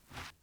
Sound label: glass jump; teardown; ps5 windows xbox series xs